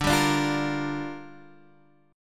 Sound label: G Minor 7th, D